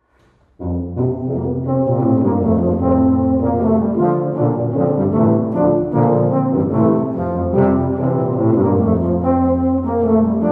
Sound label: Low Brass, low brass quartet; chansons; early music; Clement Janequin; Au Joly Jeu; Early Music Series